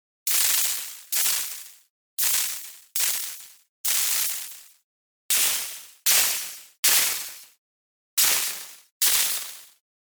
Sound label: SHORT CIRCUIT